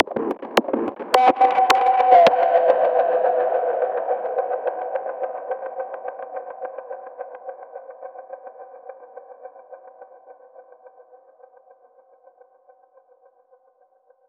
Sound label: dub techno random classic, 117 bpm